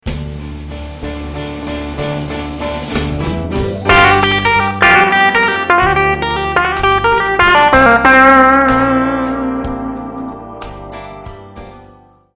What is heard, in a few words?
Guitar Lick; Guitar Lesson